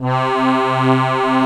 DIATONISCH D, AKKORDEON; Best Service; Alpine Volksmusik

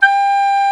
BLOCKFL TE G; FLOETE; Best Service; Alpine Volksmusik

